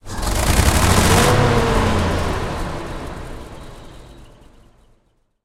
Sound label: m1 engine load, tanks; War Thunder